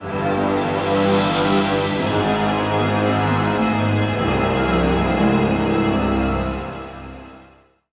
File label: Legendary Stinger